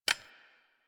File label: Double Barrel Shotgun Clip Insert; Weapons